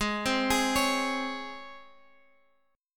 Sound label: G sharp Major Add 11th G sharp; G#add11 arpeggiated; JGuitar